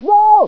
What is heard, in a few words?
scream low